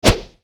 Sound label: swing katana